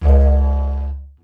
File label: Didgeridoo Kit Samples